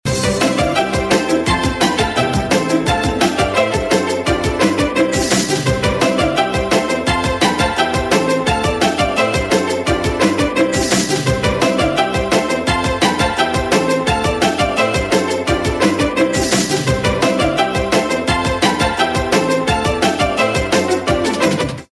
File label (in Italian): jingle; Jingle della ruota - Cena/Pranzo 1992/93